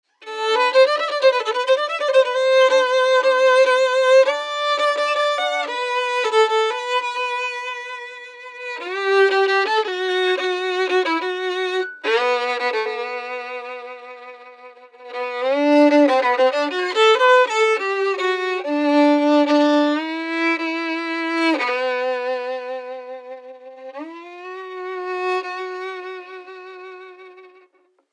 Violin Dry; Precedence

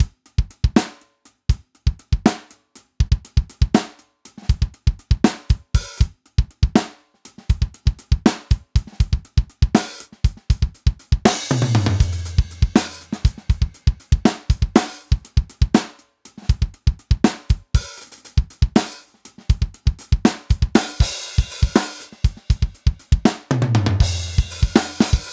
Drum Mix Dry Mono; Precedence

